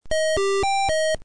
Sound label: beep